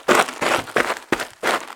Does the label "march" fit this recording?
Yes